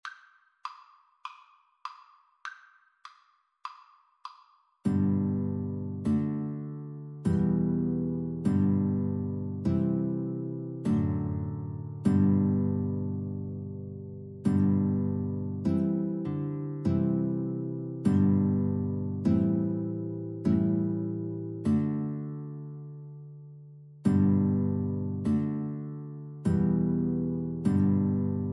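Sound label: abide with me violin guitar duet karaoke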